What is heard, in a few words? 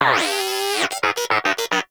Inquisitive Vocal Enemy Spotted; Pets; Warframe; Moa